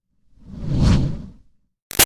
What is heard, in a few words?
Fireball Whoosh Med; Sound Design; BigRoomSound